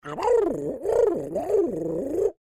Creature Oodle Bird Speak Phrase Long; Animals

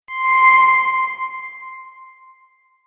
Tap Play Swell; sound design; Tap Play Swell 2; Swell Sound Effects

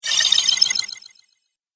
Fantasy Spell, magic sound effect

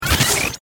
Rewind Glitch; Production Element; Glitches (Sound Effects:Sound Design)

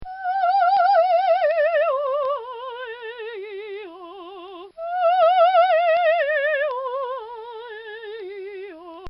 Female Singing Scale

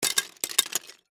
Legos Drop Handful, Into, Box, Plastic, Toys; Foley; Drop Sound Effects; BlastWave

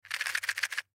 Match Box Shake, Fire Box Shake; Flames; Objects; Flame Sound Effects

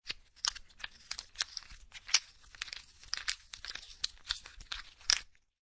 Poker Chips Stacking; Poker, gambling, chip: Stacking poker chips.; Card Sound Effects; Casinos; Lynne Music

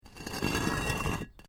Brick Medium Hollow Move

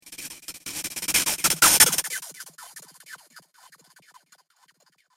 Frantic Sci Fi Data Droid; Robot Sound Effects; science fiction sound effect; star wars / trek style games; Andrew Fleming

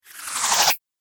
Ghost Whoosh; Ghost Sound Effects; horror

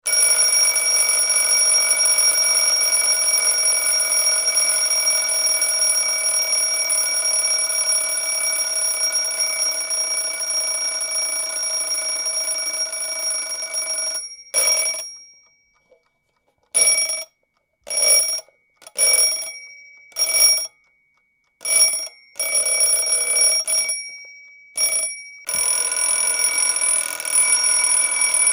Alarm Clock Ringing; Travel clock alarm; Electronics; Tom Hutchings

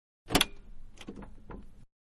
Car Foreign-Door Open-Exterior; Vehicles; Manhattan Production Music

